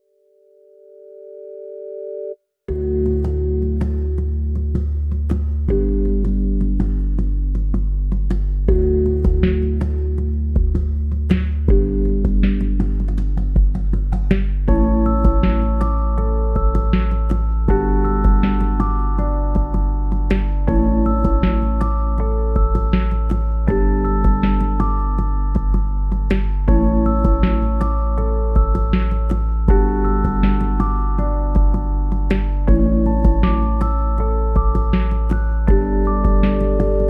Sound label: No Acoustic Guitar Mix